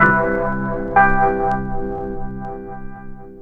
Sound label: RHODES; E-Lab; X Static Goldmine 2; akai